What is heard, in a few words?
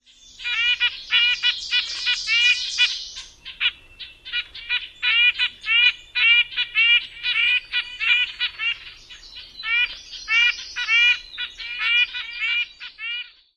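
REBRNU more calls num 1, red-breasted nuthatch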